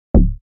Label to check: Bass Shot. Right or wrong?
Right